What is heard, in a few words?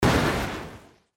Burst Airy